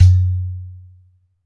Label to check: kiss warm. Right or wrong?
Wrong